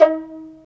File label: STR PIZ VL, String Sections, Violins Pizz; Roland LCDP13